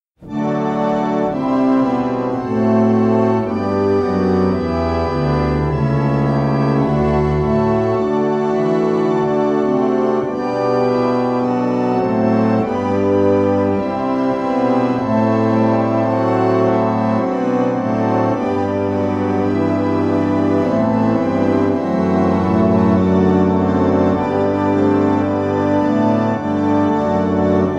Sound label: Harmonium Modell; 13 Harmonium Modell Rossini; Schiedmayer Pianofortefabrik